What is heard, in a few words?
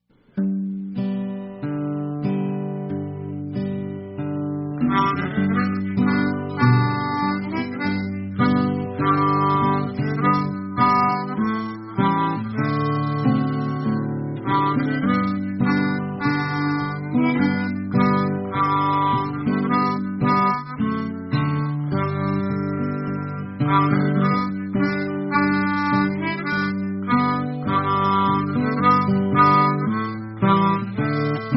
guitar Pulmonica